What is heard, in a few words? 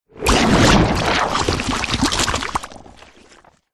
cartoon slime monster bubbling wet gloop hit